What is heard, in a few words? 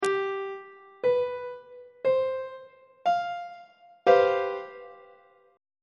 d double flat major 7th suspended 4th 2nd inversion on treble clef